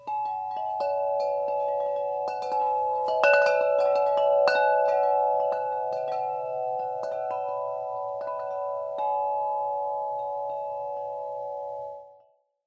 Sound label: Bell 46in, metal wind chimes; Dutch; Textured Taupe series